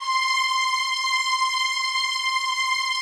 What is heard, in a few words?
PAD, DIGI-PADS